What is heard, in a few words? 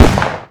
heavy ammo explode; Barotrauma; barotrauma ek